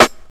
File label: rich lows hip hop acoustic snare sound d sharp key